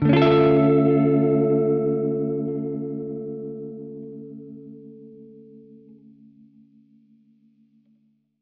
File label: Gretsch Chord Dmin9[maj7], Guitar Chords